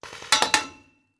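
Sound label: Paintball Gun Impact; Sound Ideas; Guns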